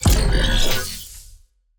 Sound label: SFX CHAR SPAWN